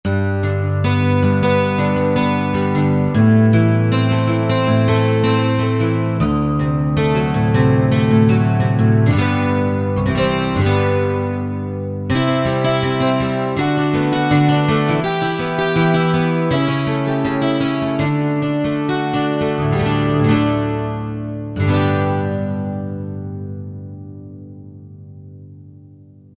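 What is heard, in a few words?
Spruce Guitar, Korg 05RW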